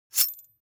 weapons knife slash

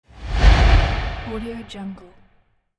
air sub swoosh; music-fish

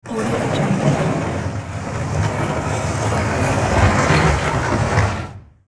metal cauldron dragged; sound ideas

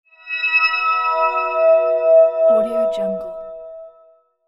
peaceful transition

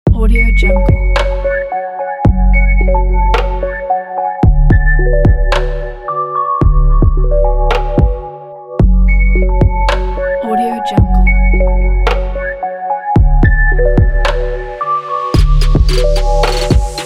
mystery dream

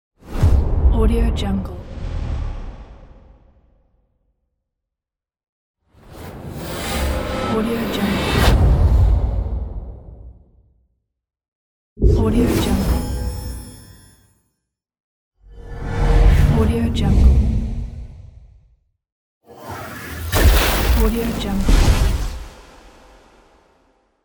magical spell sound pack; infinity sfx productions

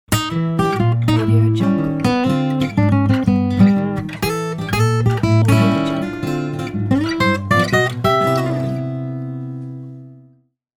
jazz guitar logo

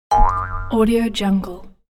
spring bounce

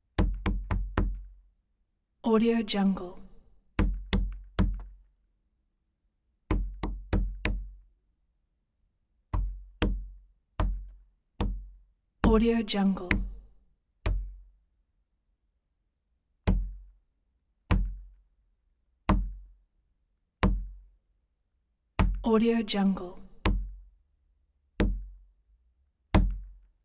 door knock flat hand pounds resonant wood

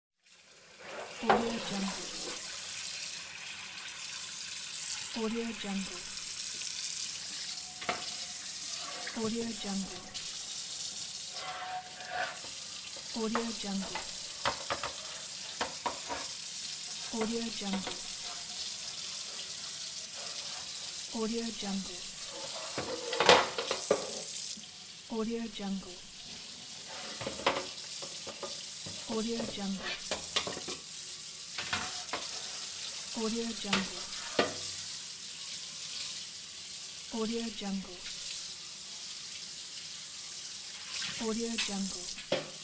washing pans

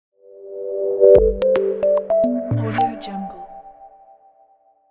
modern elegant ident

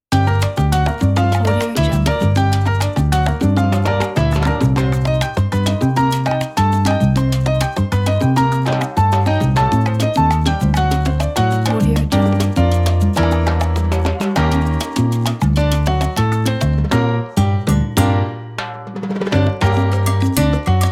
golden latin salsa

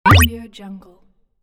cartoon sound

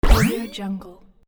boost

